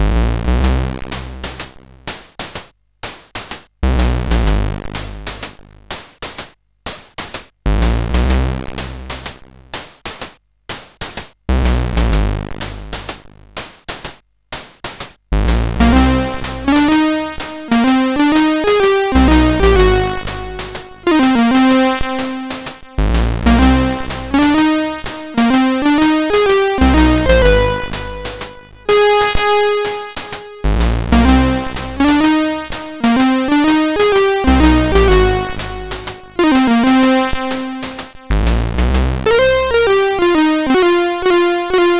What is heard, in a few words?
Theme, CSG8580R5